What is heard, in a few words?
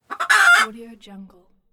chicken squawk